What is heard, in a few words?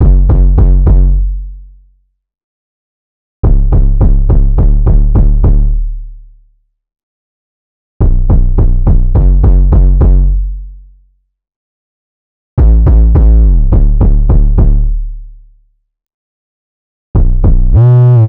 Synth loop 105 Gm, Synth Bass Loops; Slate Digital BASSHOLE Sample Pack